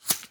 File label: bullet by supersonic tail; weapons; nearmiss; Counter Strike Model Pack; CS2